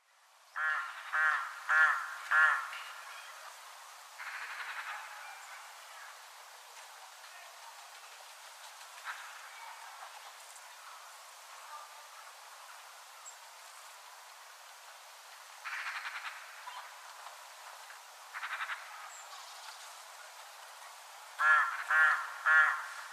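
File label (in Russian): ворона крупно в деревне зимой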